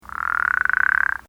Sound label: sintermontana solo, frogs